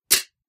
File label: plates hit; Kenney's Sound Pack; Foley Sounds; Plating